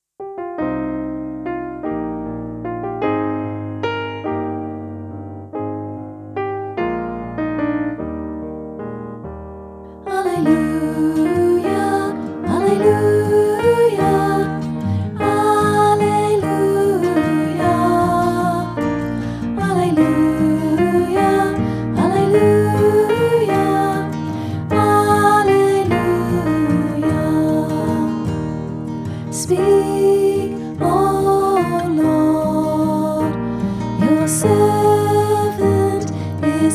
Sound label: Alleluia Gospel Acclamation